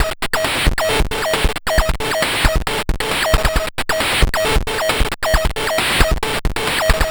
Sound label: Drums Strange